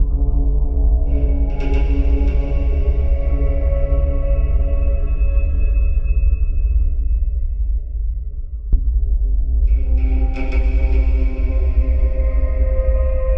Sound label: Desolate City Ambient Stereo; Revulsion